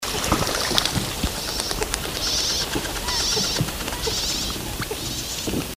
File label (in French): canard a queue pointue, chant